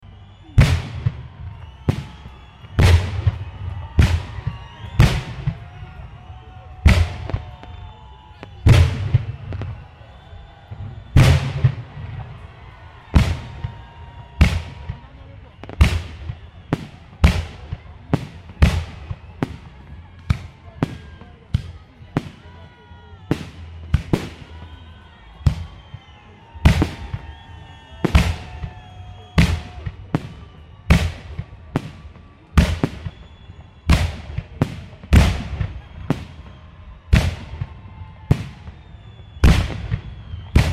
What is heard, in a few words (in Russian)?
salut